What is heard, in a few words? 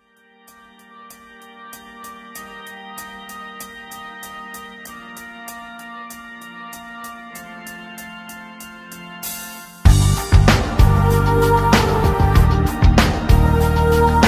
rhythm